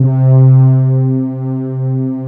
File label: MR BRASS C3, BRASSY, Synth